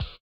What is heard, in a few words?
slip slap; Spectrasonics; Metamorphosis